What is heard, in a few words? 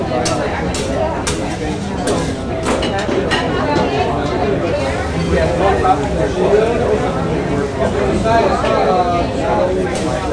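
background music; Virtual Graceland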